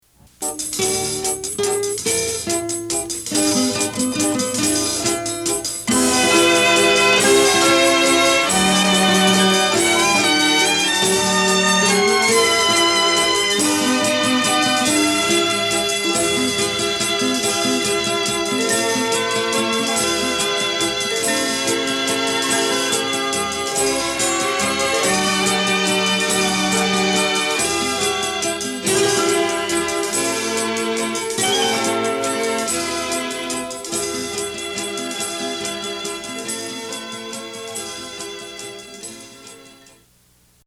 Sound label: Instrumental